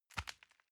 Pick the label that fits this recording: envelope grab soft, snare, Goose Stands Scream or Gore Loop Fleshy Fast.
envelope grab soft